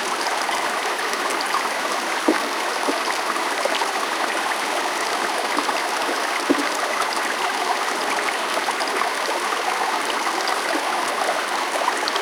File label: stream with bird chirping